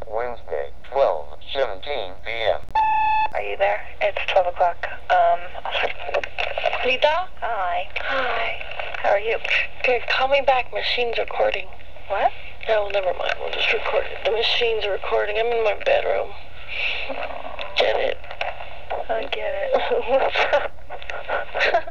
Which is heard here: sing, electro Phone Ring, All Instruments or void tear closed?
sing